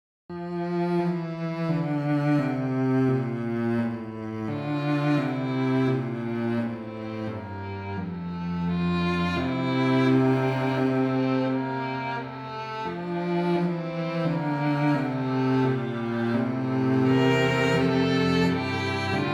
Christe du Lamm Gottes in F Major BWV 619 Strings Version